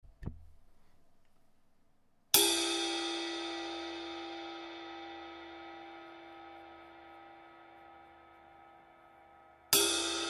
Ride Rock; Thrace; Amedia

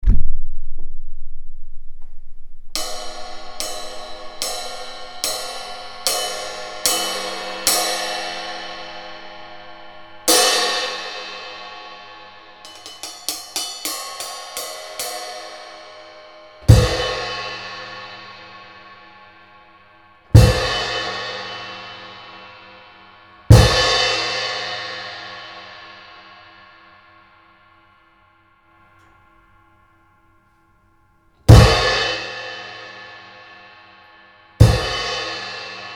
Crash 15; Old School; Amedia

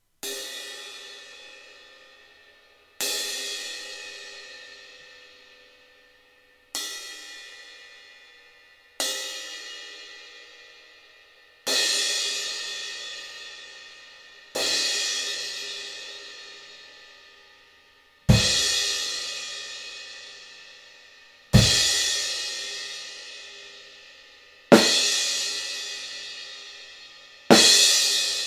Crash Medium Thin 16; Amedia; Classic

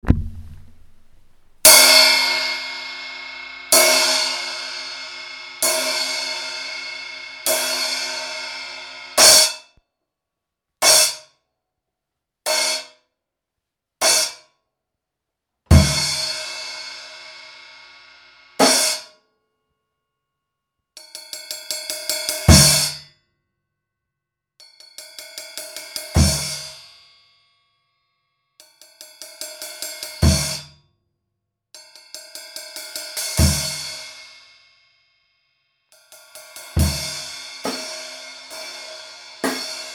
Raw Rock Splash 12; Amedia

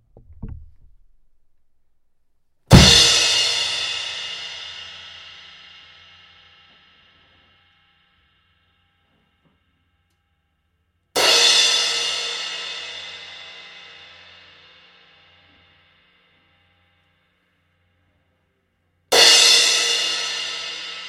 Crash Thin 15; Thrace; Amedia

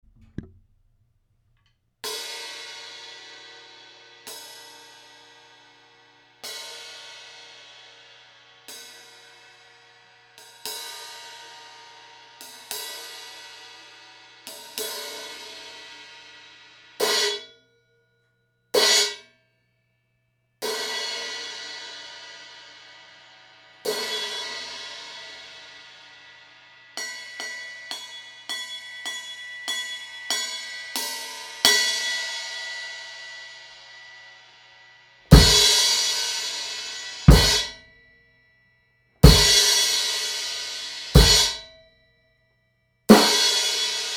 Vigor Rock Crash 16; Amedia Vigor

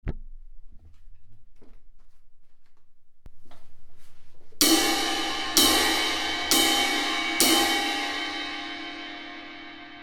Crash 18; Galata; Amedia

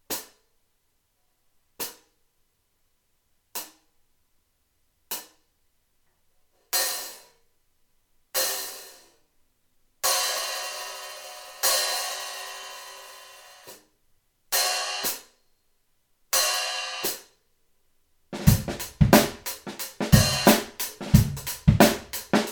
Hihat Flat Hole 13; Amedia; Dervish

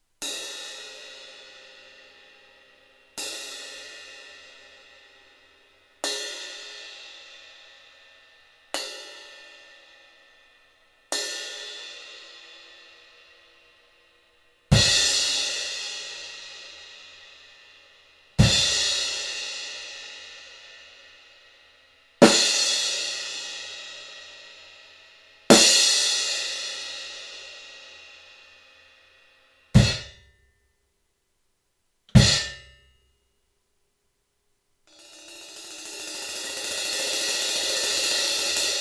Classic Crash; Amedia

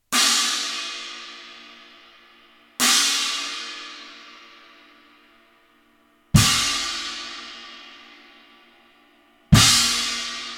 Classic China 16; Amedia

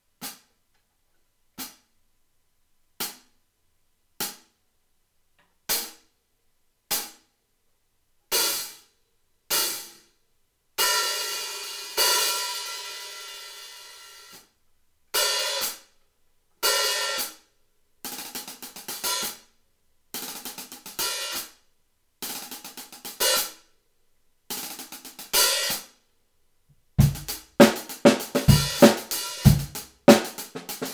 Classic Hihat Heavy 14; Amedia

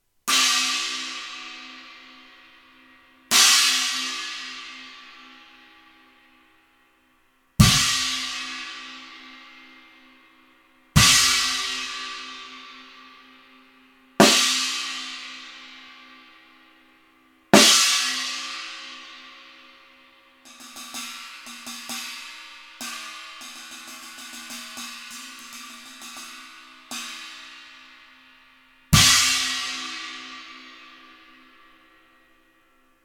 China 16; Thrace; Amedia

